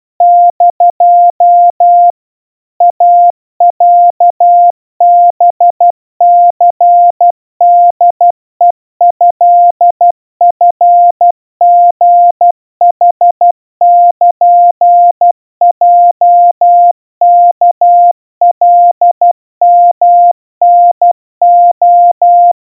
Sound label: iroha 40 cpm 700 Hz, Morse